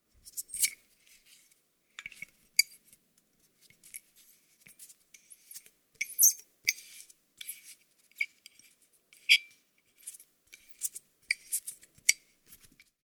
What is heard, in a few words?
Corkscrew